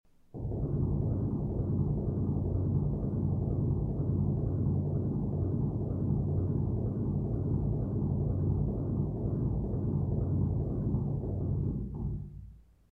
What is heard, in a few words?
tapping